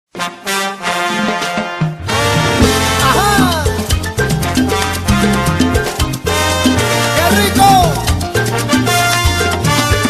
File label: Happy Birthday Rio Style Cumpleaos Feliz